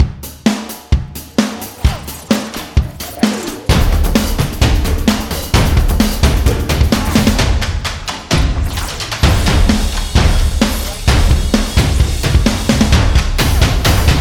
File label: drummin